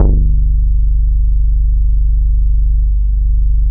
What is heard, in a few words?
BAS G1, 2 Step; USB Soundscan vol.51; House Side Of 2 Step; AKAI